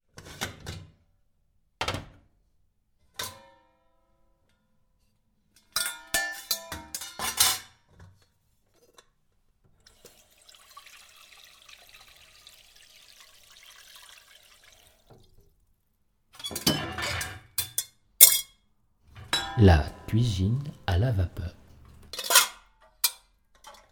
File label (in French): la cuisine a vapeur; virgule